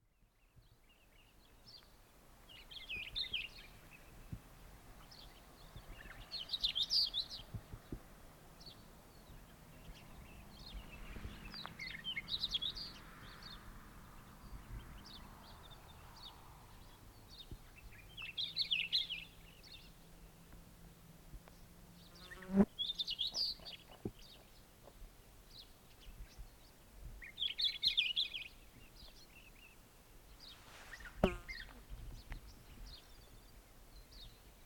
Hume's wheatear

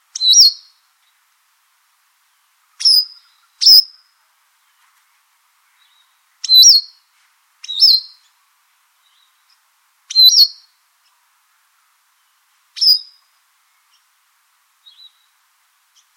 Cape wagtail

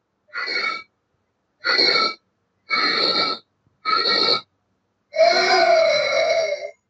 Pangolins; پانگولین

